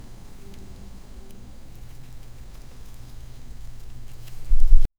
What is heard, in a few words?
gentle breeze passing thr